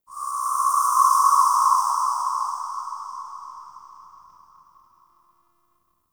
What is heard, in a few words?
Ext Wind Dissonnant Death Howl; Ambience; Misc Outdoor; Warframe; Infested Eris